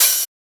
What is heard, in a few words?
Open Hat; FLPRO